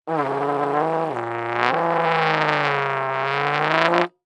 Cartoon Trombone Wet Sound

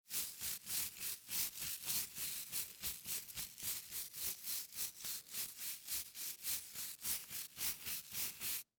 Human Teeth Brushing Teeth Long Strokes

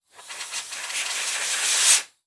Industry Tool Blow Air Gun

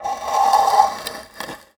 Foley Wood Scrape On Concrete

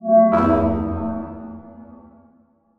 Transition Musical Tone Medium; Production Element; Title Transition; AdobeCC

